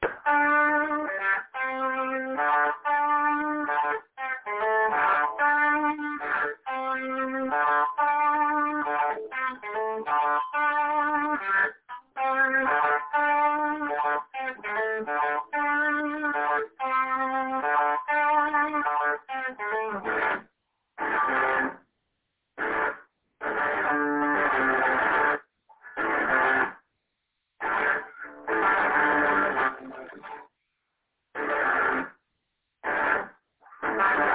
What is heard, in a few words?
start of heavy same amp